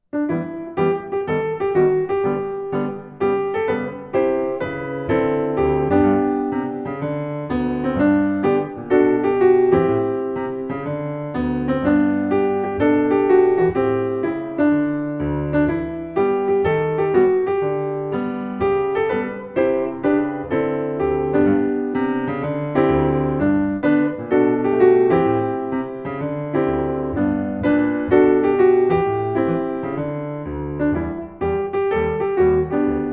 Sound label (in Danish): piano melodi; Tre tulipaner og en pølsemad; DR Musikariet; Skolernes Sangdag